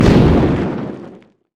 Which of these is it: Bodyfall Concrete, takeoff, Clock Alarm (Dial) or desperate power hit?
desperate power hit